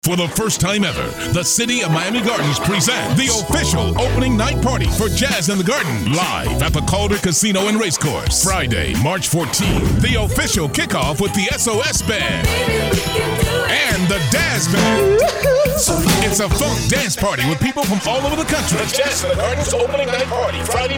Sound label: jig kick off